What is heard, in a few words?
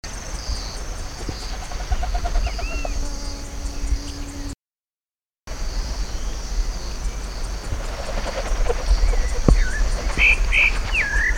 Cypseloides senex